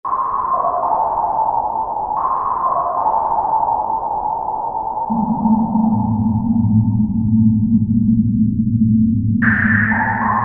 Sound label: Cold JX; Roland SR-JV80-11 Techno Collection